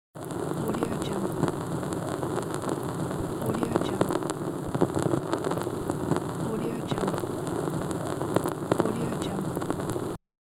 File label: vinyl noise emulation